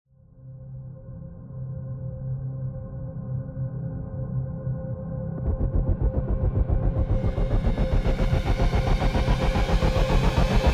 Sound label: Rise; Ninja Tracks